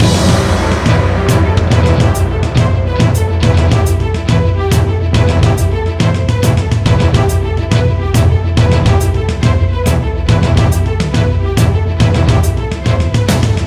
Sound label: bumble bee, orch